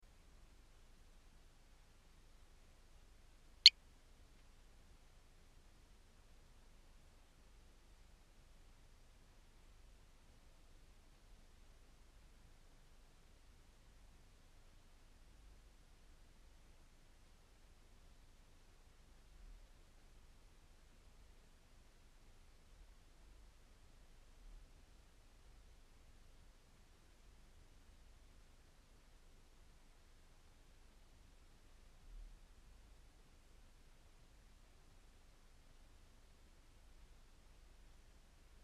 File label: Fault signal, rauchmelder